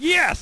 got goodie

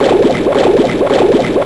WATERFAL; Spanish Net

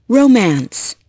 ROMANCE

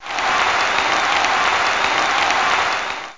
applause

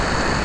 Halon; A-10 Attack! Cuba Demo

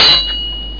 SWORD HIT

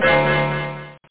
flag; apogee

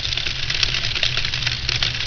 torch

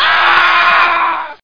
arrg

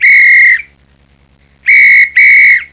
whistle; WAV Sound Effects; whistles

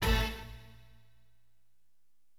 STAB, AcidJazz Funk Fx; LiveAct Pool

